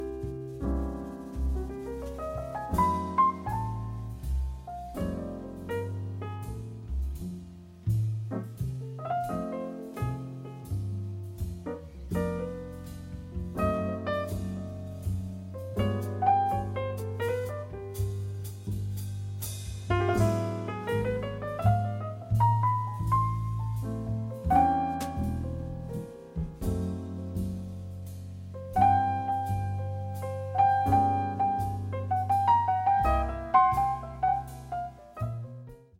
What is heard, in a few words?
Concerto for 3 pianos in D minor Alla siciliana